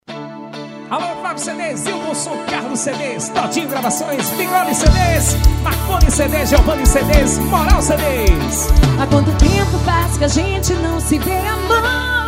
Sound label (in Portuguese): forro universitario